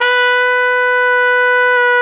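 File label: trumpet, jazz; Roland L-CD702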